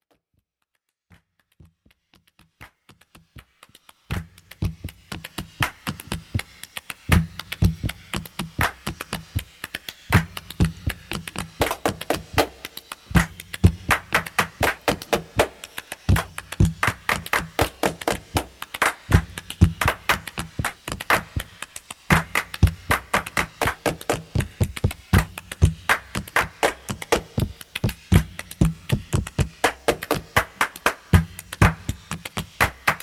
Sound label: Handclaps And Feet